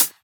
Closed HH

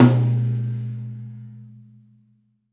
Tom, Percussion One Shots; Essential Percussion

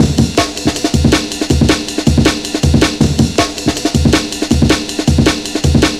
160, Drum Loops; Total Drum&Bass

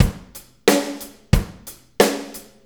90 ROCK, DRUMS

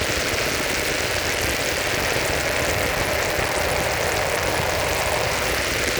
Panned Noise, non tempo loops; stereo toolkit samples

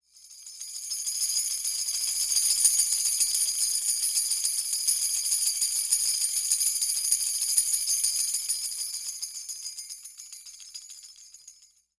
Tambourine Shaker, East Indian; Sonic Foundry (Sony Creative Software); Continental Drift Aftershocks

